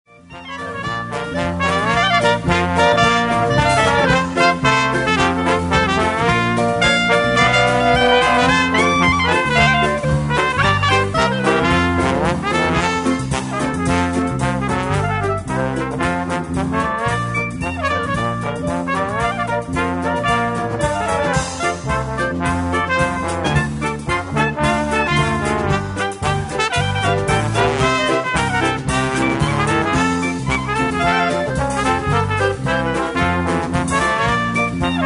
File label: ragtime band